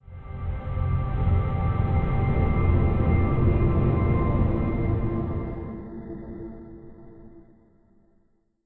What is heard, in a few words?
Windows Startup; Windows Never Released Soundpack 2; deviantart